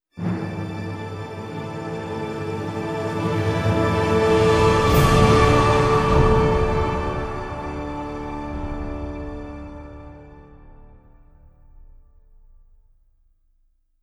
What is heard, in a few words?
Triumph, music; The Elder Scrolls III Morrowind GOTY; XBOX